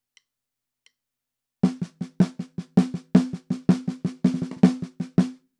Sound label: perc bridge snare